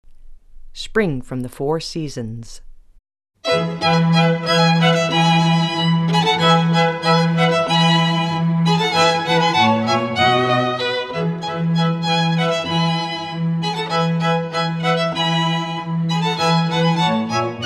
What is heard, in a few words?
spring, string quartet